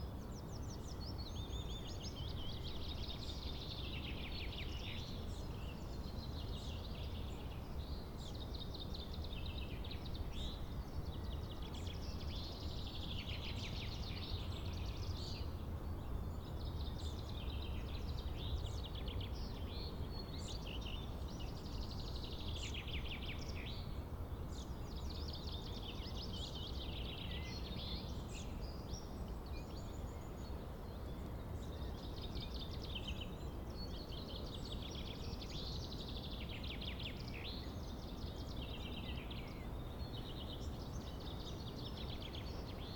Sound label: Calm Forest Heathland